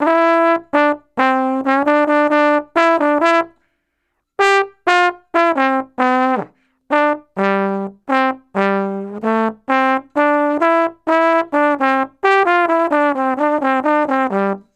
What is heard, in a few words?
Trombone Solo, Jazz; Sonic Foundry (Sony Creative Software); Jazz Solos and Sections